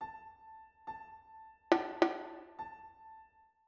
rhythm compound 2 beat

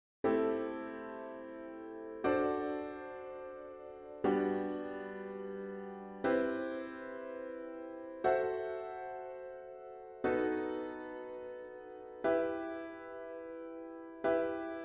chords only

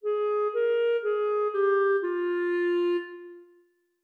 5 notes F min

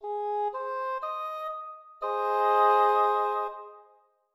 triad Major Ab4

